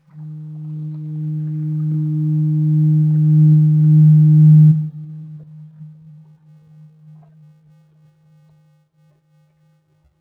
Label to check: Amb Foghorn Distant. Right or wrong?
Right